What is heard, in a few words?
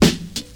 hip hop acoustic snare sample E key